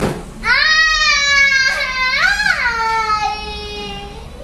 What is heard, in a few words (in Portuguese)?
voz aaaaaaaaaaiiiiiiiii